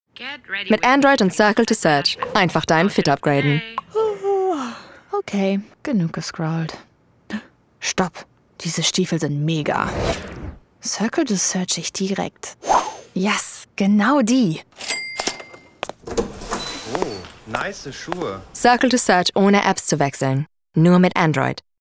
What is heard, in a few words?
radio boots